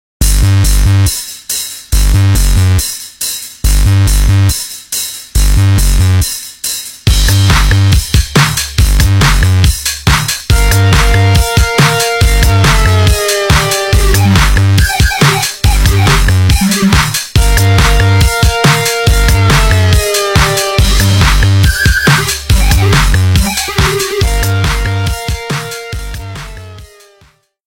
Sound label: Whoop, Ringtones; Nokia; 5130 XpressMusic